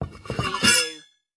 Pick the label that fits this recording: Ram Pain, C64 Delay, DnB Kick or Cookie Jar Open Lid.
Cookie Jar Open Lid